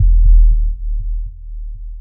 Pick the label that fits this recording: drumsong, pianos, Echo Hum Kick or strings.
Echo Hum Kick